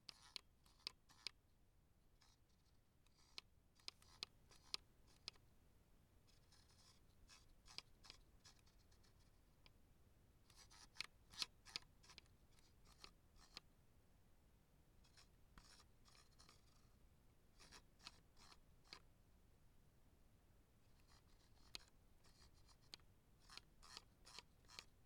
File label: Computer Mouse Scrolling